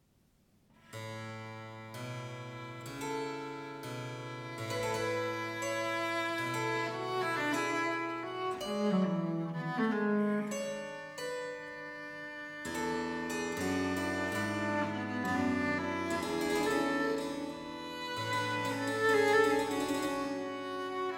Sonata for Viola da Gamba and Harpsichord in G Minor Adagio